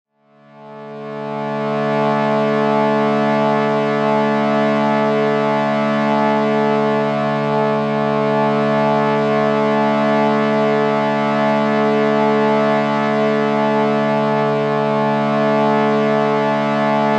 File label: Shruti Box D A 432Hz